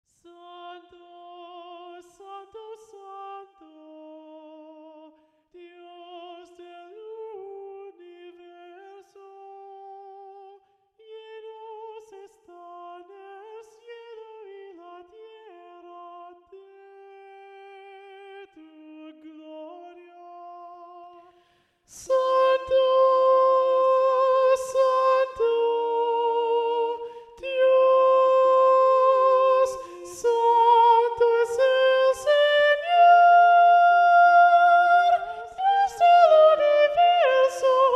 Treble 3 Predominant; Santo Santo Santo

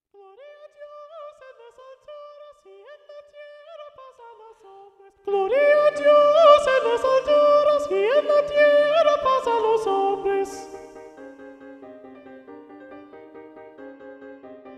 Treble 3 Predominant; Gloria a Dios

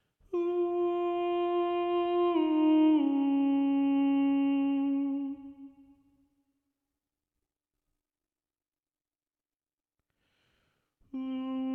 Treble 1 Muted; Senor ten pieded

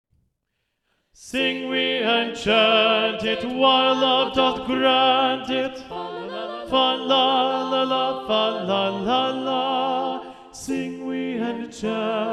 SATB Tenor Predominant; Sing We and Chant It; Thomas Morley arr Russell Robinson

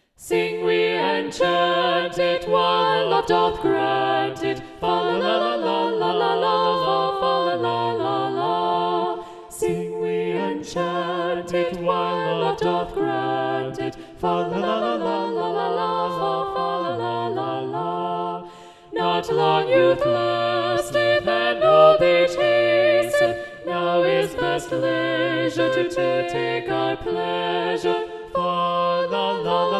SATB Tenor Muted; Sing We and Chant It; Thomas Morley arr Russell Robinson

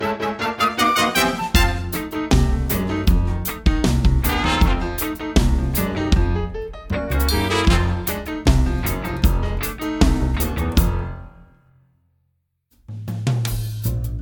Miracle Ensemble Accompaniment

